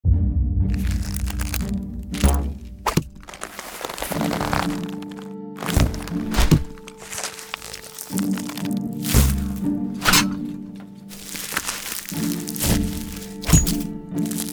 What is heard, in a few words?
arrow barrage; echo collective